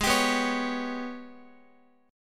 Ab Minor Major 11th down Guitar; strummed; JGuitar

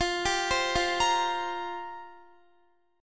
F Suspended 2nd Suspended 4th F down Guitar; Fsus2sus4; arpeggiated

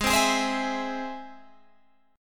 G sharp Augmented G sharp Guitar Open G

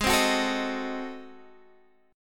Ab 7th Flat 9th down Guitar Open D

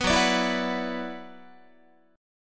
F sharp 7th Suspended 4th Sharp 5th, Guitar

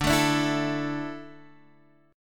D Suspended 2nd D, down, Guitar DADGAD

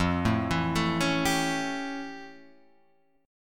F Augmented Major 9th F Guitar Drop D

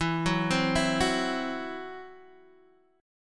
E Suspended 2nd Sharp 5th E, down, Guitar, Open D

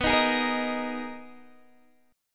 Eb Suspended 4th B; Ebsus4/B strummed; JGuitar

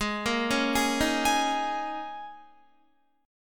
Ab Suspended 2nd Suspended 4th, down, Guitar; arpeggiated; Absus2sus4

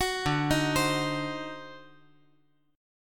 D 7th Flat 9th D down, chord

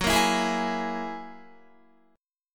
F sharp 9th F sharp Guitar; F#9 Chord; strummed

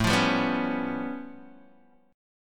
A Augmented 9th A Guitar DADGAD

